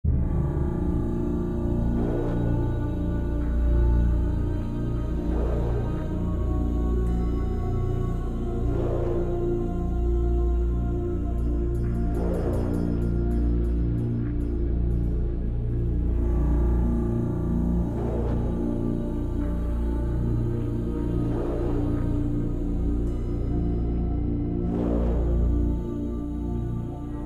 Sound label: Menu Main; Infinity Blade 3